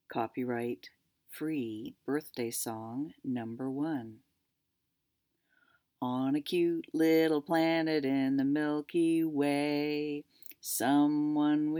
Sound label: two free birthday songs